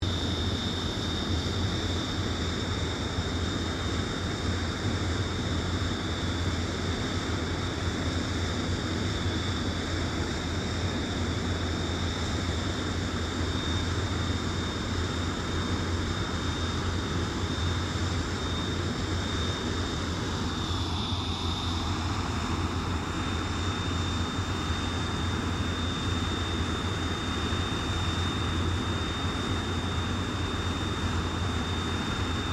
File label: AIRCRAFT CA-27 SABRE IDLE; CA-27/F-86 Sabre; Airplanes (Sound Effects:Vehicles)